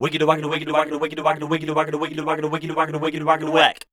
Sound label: SCAT, Voice Spectral